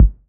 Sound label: Kick A; Aera; Way Out